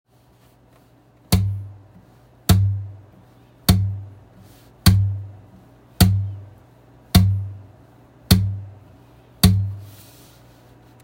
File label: string slap, percussive sounds on the guitar